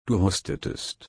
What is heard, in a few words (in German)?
husten